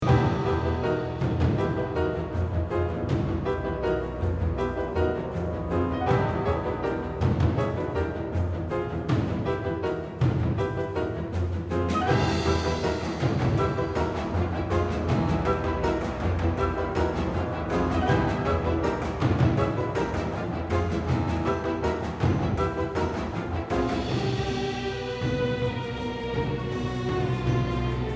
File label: Boss LOOP; From the Shadows